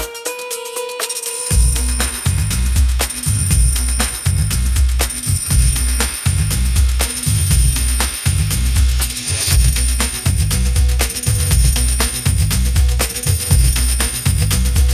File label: pop groove; ai-generated